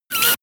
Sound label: air pressure release